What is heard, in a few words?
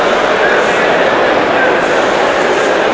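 crowd ambient; TrickStyle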